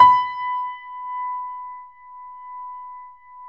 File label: B 4, Keyboards Acoustic, GRANDP ST; USB Soundscan vol.17